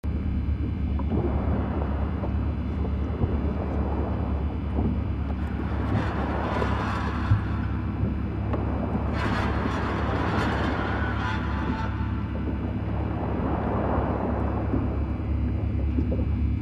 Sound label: Amb Sub Int